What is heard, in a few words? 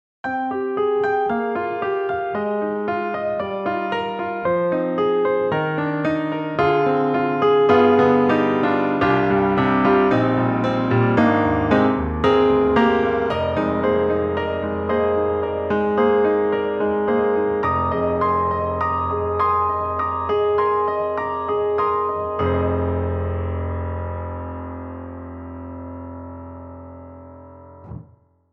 Grand Rhapsody Piano, In the Mid; Waves